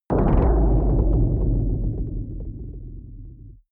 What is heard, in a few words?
protective shield hit absorption rattle; energie